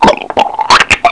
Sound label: Pump Suck; Crystal Crazy Demo